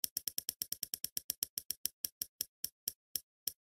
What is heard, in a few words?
spin